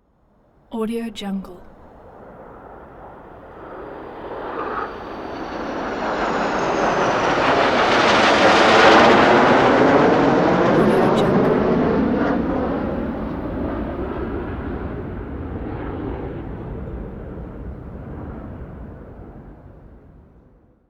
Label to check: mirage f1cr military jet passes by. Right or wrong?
Right